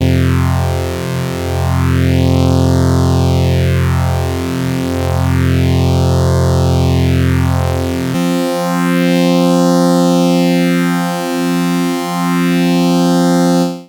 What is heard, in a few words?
prodigy stereo polyphase